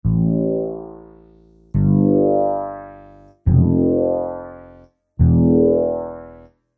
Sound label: Moog Pattern AGFE; Loopology; Crying & Dancing; Techno & Dance